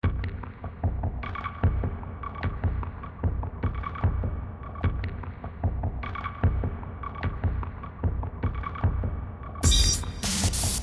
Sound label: battle; cards